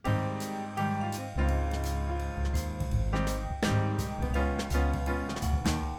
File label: music